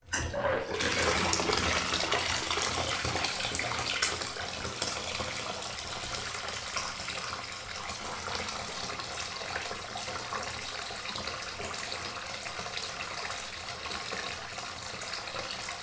household bath partially filled resume filling

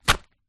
household rubber glove drop down on surface

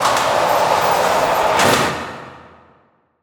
Jail Cell Door Close; Blastwave FX

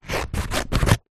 office scissors cut paper close up; zapsplat

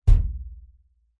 impact metal hollow thump side washing machine

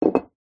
food soy sauce bottle down on wooden worktop; zapsplat

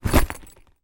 foley suitcace small empty shake single

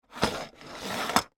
toy plastic wrench slide across plastic workbench

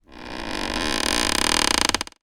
office chair creak; zapsplat

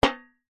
impacts metal tray hit muted hard

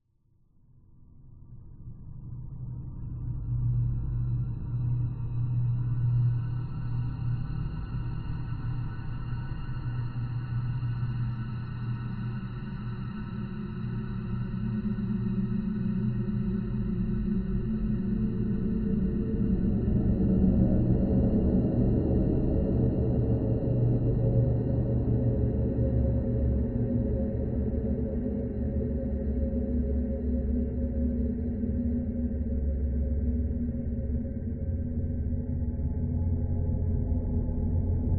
horror atmosphere dark lfe droning confused; scott lawlor

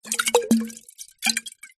food drink wine bottle shake lid off wine inside; zapsplat

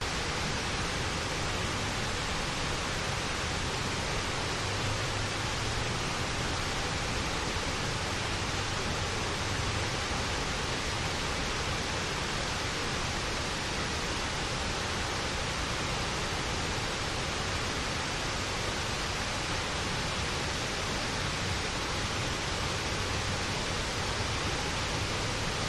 waterfall in far distance; bridal falls; your free sounds

